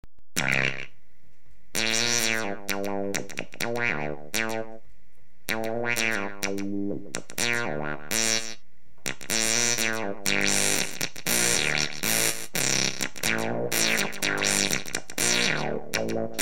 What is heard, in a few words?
moog